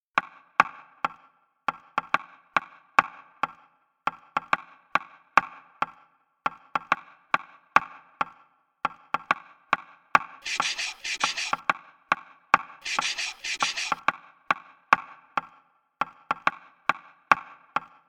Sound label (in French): Musique; Accord et onde : la musique mathématique; Lycée intégral Roger Lallemand